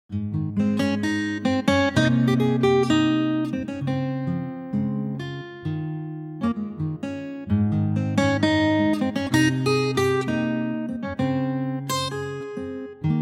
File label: Ab acoustic guitar